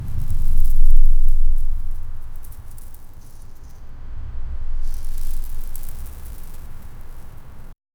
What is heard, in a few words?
low end thud from stone t; impacts